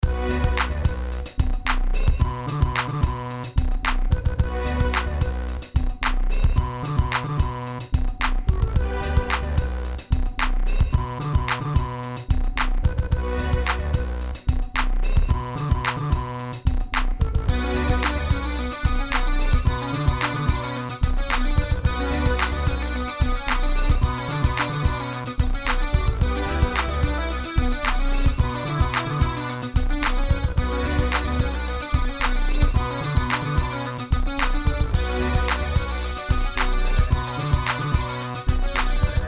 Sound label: loop saw bass staccato flute synth progression clap rhythm; songsnacks